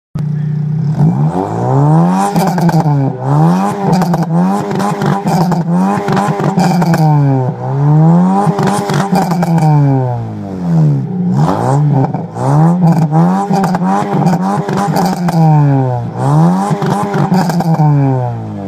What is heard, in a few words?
Pride Car Exhaust Sound